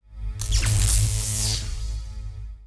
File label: Spark, ambience